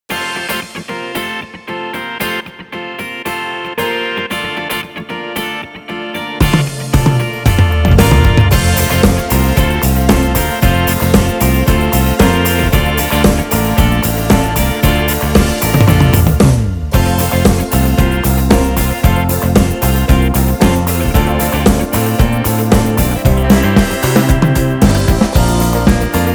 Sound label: Guit Groove